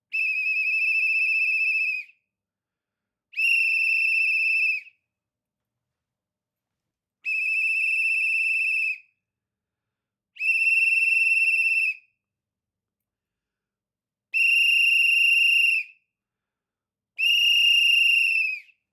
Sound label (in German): Pfiff 6x